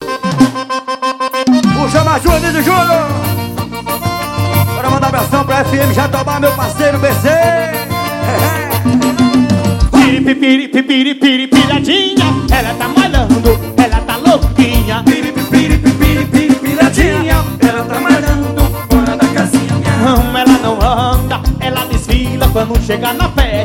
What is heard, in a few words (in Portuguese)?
forró de serra